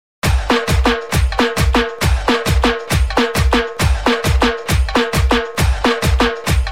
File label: Tapori Beat